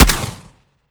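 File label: weap suppressed; gmod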